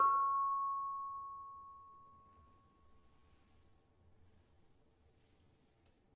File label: Barung 6 C Sharp, gamelan tuned; gravityharps